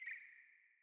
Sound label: graphics high wobbly screech; soundscrate